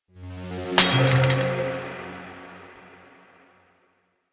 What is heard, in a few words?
Spell Freeze Golem, magic sound effects